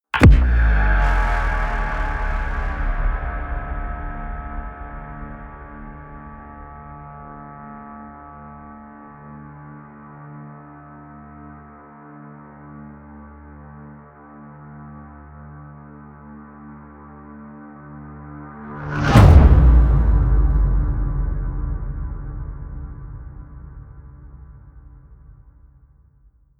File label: promo pad; whooshes rises; hits impacts